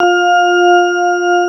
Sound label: CX3 ORG F4, Keyboards Old School; USB Soundscan vol.09 - Keyboards Old School; AKAI